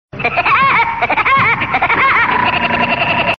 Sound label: hehe